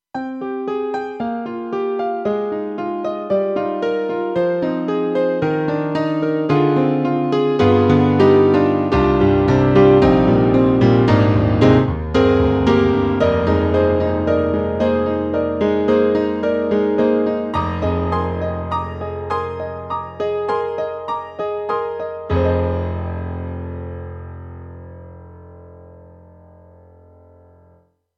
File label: Classic Rock Piano; KORG M3 XPanded Program